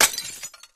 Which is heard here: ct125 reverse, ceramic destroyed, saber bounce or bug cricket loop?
ceramic destroyed